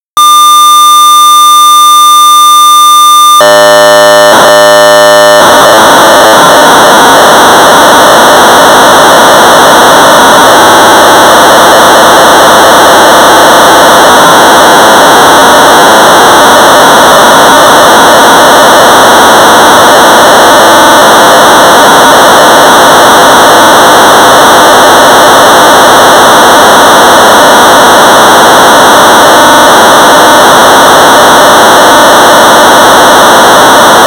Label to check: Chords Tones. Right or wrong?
Wrong